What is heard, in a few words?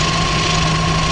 minigun spin; Weapons; EK modern; Barotrauma